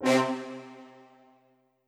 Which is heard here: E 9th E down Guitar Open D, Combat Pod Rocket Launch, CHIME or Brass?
Brass